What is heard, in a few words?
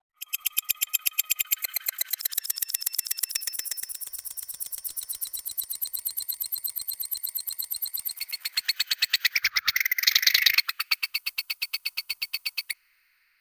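giant may beetle wing flapping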